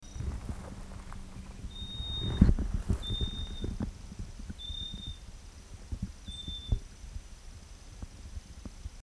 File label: Nothura minor